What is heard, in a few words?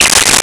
Birdtweet; Nintendo; July 2020 Gigaleak